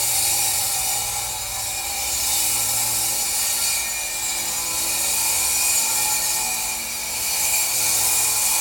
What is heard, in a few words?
Grinder on metal